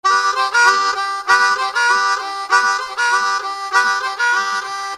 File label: Effects, harmonica